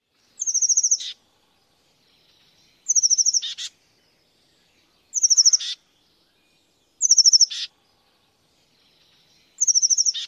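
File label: oak titmouse call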